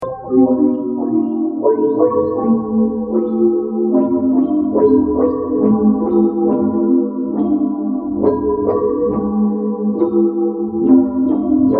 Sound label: spherize electric piano pattern